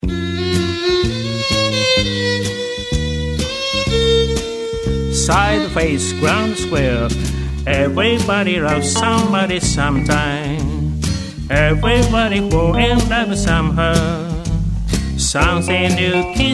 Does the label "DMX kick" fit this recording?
No